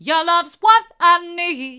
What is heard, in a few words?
hard, vocal